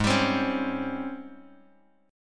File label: G sharp Major 11th G sharp; G#M11 Chord {4 X 5 5 2 X} strummed